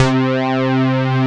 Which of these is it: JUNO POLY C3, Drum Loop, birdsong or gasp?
JUNO POLY C3